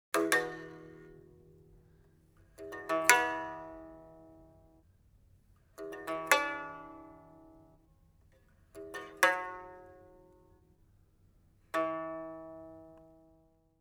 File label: biwa